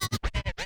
Transform Med, vinyl record cuts longs

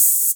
Open Hihat; Vengeance Samples; VEE Cymbals

